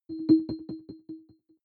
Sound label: button echo chord; maschinen; signale